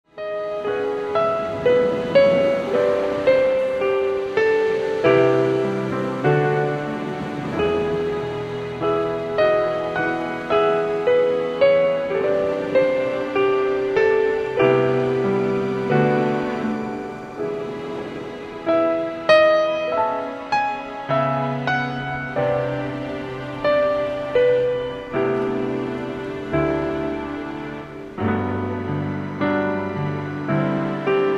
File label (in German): nordsee entspannung, northsea relaxation soothing sounds piano; crushing waves